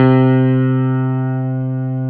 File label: CP70